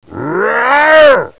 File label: zombie die